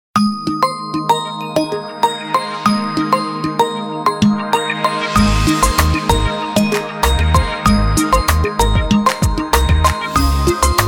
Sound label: Buoy, ringtones; Alcatel OneTouch S'Pop 4030D